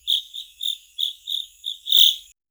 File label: sound of a rat in